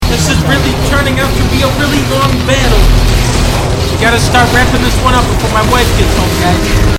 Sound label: long battle